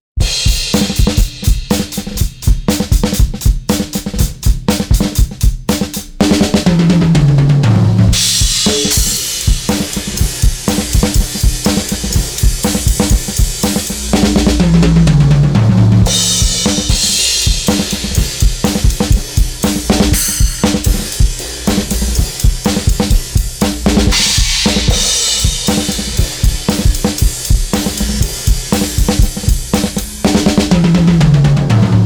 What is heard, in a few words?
drums Buss; Nanopulse; Beef